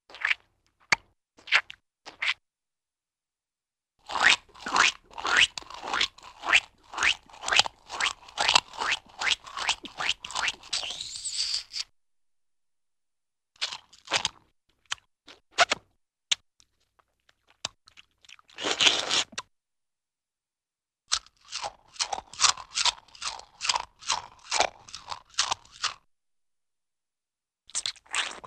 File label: Juicy Chewing Or Smacking Lips, Cartoon; Hollywood Edge; Cartoon Trax